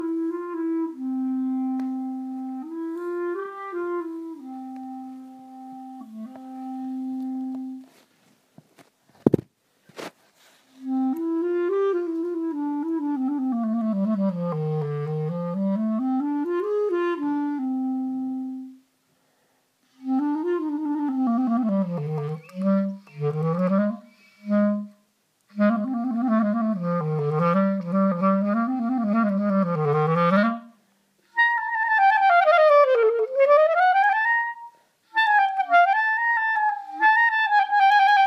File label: clarinet sample